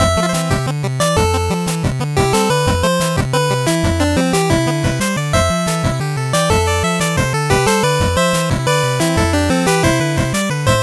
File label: 8bit bgm normal